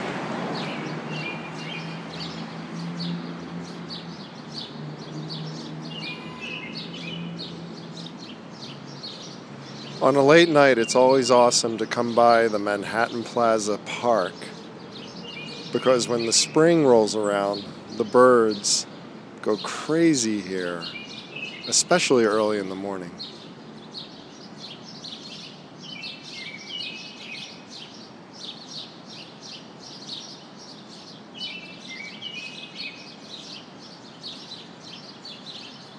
birds singing early in the morning at manhattan plaza park